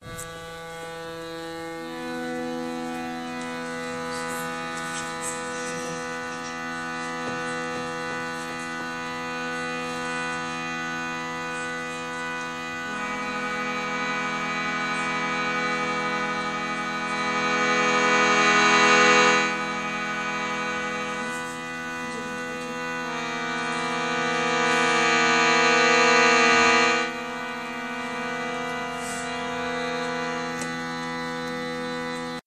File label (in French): accordeon